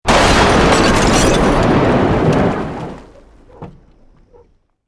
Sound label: Building Collapse Medium